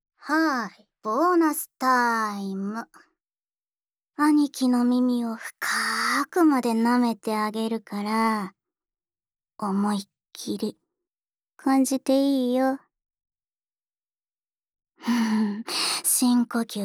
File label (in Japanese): 声が出ちゃう深い耳舐め（左右耳）; 童貞兄妹